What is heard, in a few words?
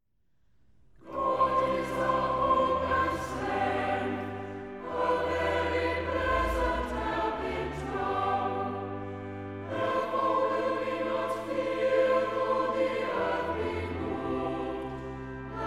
Church Choir; Psalm 46